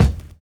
VINYL BD, Drums Dance & Groove; USB Soundscan vol.11; AKAI; VINYLKIT

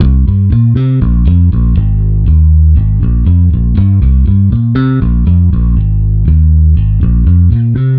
Bass Guitar 120BPM Cm; 99Sounds x Monosounds; Instrument Loops

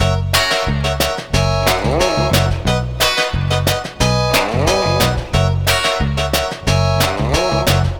Lounge Full Mix-F#; Zero-G Kocktail Kollection; Construction Kitz (Lounge); Welk Lounge 090

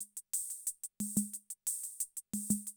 CR78, DrumLoops

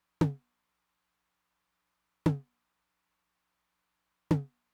high tom, Roland TR-909; Roland TR-909 Ableton Project

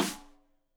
Rim Shot Stereo; Deeply Sampled Drums; SMDrum

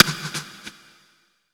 STICK; East West - Phat & Phunky CD2; 90's Sample CDs

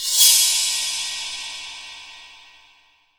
18 OLD, 18INCH OLD ZIL RIDE

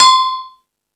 Percussion, Drum Machines E-MU Pro-Cussion

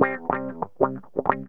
GTR, Funky; Zero-G; Funky Elements

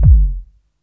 Feel The Sub, Kick Drums; Zero-G; Soundclash

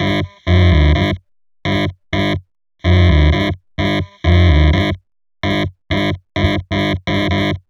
127 sound 1 L, Fresh Disco House; USB Soundscan vol.20; AKAI

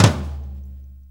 4-4 Tom Flam, Drum Loops

